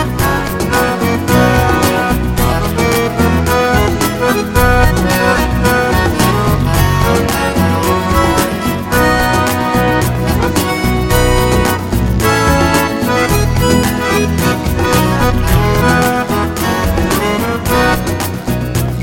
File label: Hot Flamenco Song